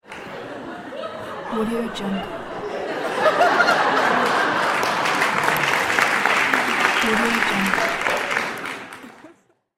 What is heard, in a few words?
medium crowd laugh and claps